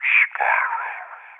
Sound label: whisper; Spyro